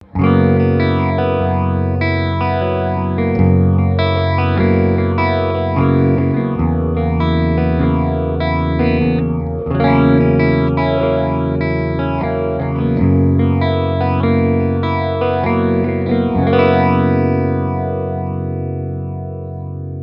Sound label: phaser